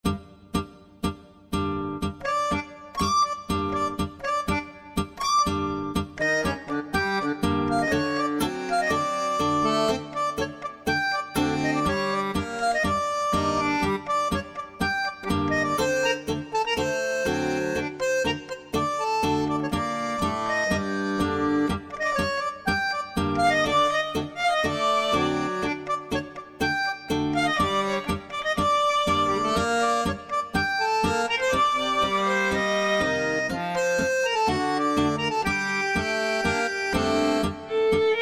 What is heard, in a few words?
tango trio